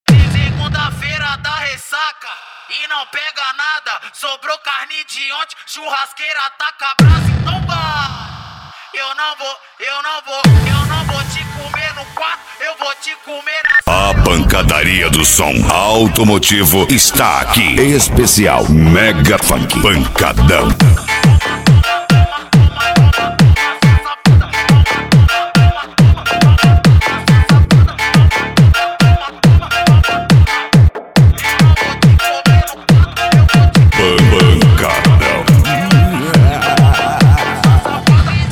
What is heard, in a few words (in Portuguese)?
mega funk pancadao